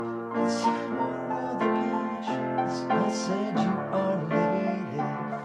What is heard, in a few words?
singing